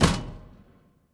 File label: Motoboy Hit